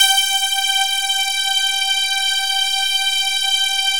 LEADS; Masterbits; Vintage Voltage

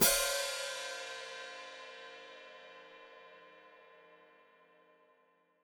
Hat Closed Open, Hi-Hat

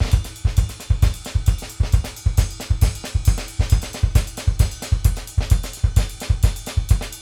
CYMBY SAMBA, BRZIL 132; Sampleheads; New York City Drumworks VOL-1

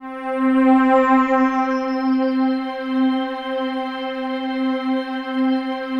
COSMIC, PADS; Masterbits; Vintage Voltage

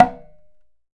Conga Slap ff; Digital Sound Factory

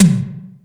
TM ELE, GATE KITS; Crypton; DrumBase5000

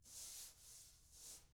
TAMA drums; ILIO; Double Platinum Drums 1

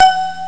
ex twi Plink; Amiga Soundtracker Sample Packs

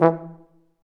Tuba stacc, F TUBA; Roland L-CDX-03

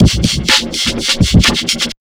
loop, Electro 125, Big Beat Drumloops; USB Soundscan vol.25; AKAI

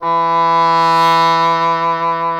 E. Horn

